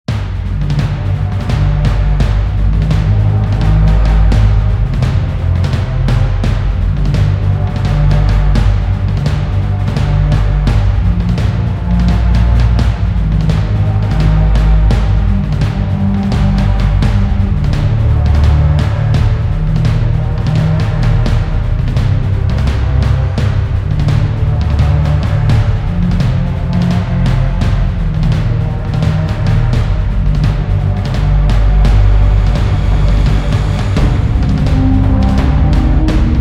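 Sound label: Battle Drums Pad; Real Warfare 1242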